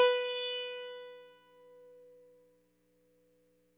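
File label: electric sitar soft; Quantum Leap - Guitar & Bass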